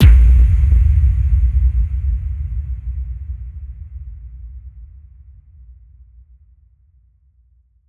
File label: Impact; impact samples